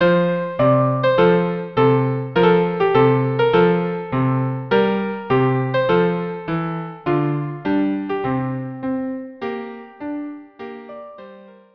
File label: 2 violas; Toreador song